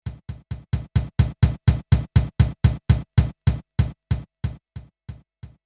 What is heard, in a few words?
Pad Dynamic